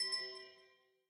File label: Amethyst resonate